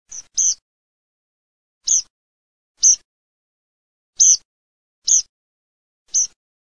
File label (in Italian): PRISPOLONE